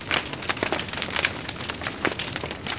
quemar

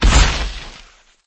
caida

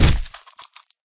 caida

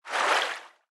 nadar

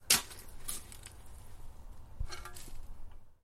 cavar

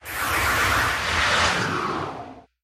pegaso

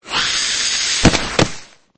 caida

